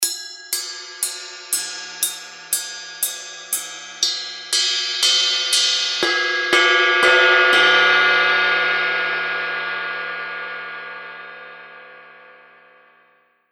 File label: Zildjian Z Custom 20 Inch Rock Ride Cymbals